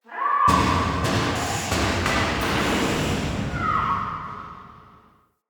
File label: Animal Interior Destruction; Encounters; Ode Pollution Tower